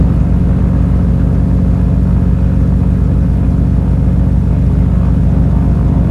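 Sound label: morava cockpit right, engines; War Thunder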